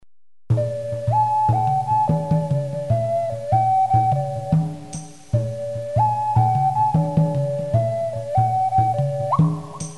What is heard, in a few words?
atmo; music